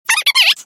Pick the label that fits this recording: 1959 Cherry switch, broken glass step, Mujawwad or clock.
clock